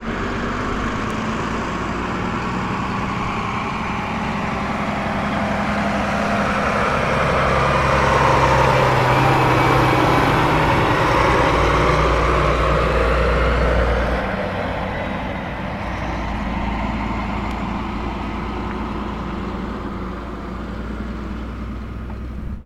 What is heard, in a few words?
transport truck passing